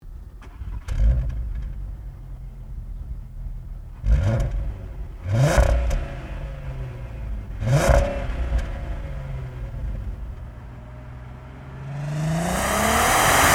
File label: Volkswagen Passat 3.6 SEL